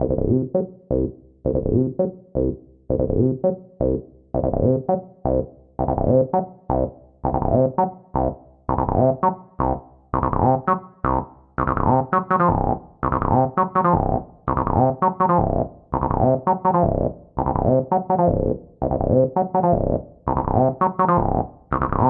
tb303 filter journey; SYNTH AND ELECTRONIC

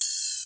Open Flang Hat, Hip Hop; drums; STYLE KITS (Hip Hop, Etc); Khezie Hip Hop Kit

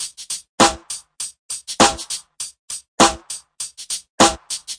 100 bpm no kick hip hop, drum loops and breaks; 091 to 100 bpm

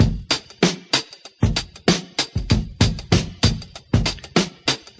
96 tricky cruncher, drum loops and breaks; 091 to 100 bpm

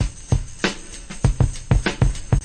nice drop, drum loops and breaks, 098 bpm; 091 to 100 bpm

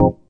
stabs hits

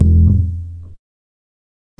Upright Bass Notes

